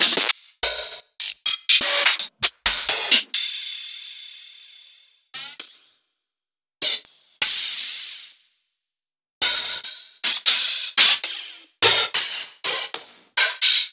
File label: Open Hihats